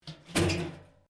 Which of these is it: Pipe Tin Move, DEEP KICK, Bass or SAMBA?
Pipe Tin Move